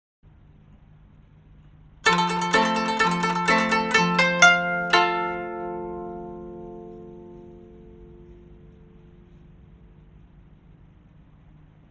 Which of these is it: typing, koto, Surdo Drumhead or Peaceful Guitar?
koto